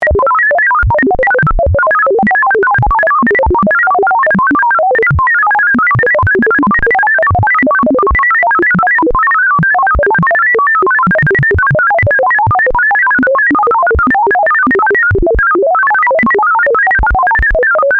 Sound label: Sinus Random fast old computer